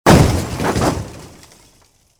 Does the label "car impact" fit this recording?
Yes